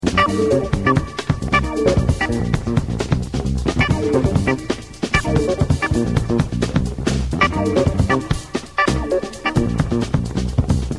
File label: free jazzin; SONNERIES MUSIQUE